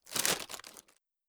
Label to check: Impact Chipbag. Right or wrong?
Right